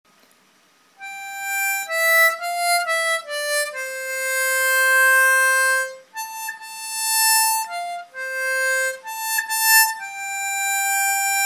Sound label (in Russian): губной гармошке